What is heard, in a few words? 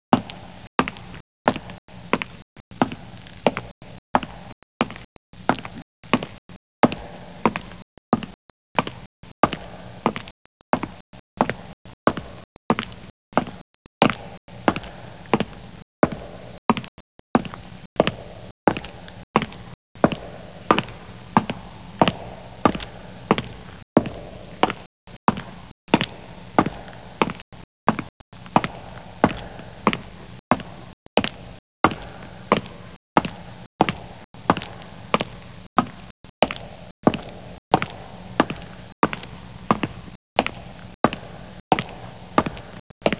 steps